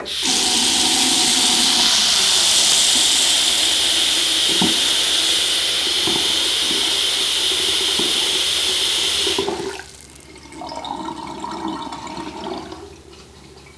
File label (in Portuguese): pia, Sons domésticos